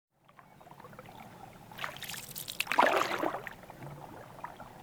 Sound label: Canoe Paddle Light Paddling In Canoe; Electa Quinney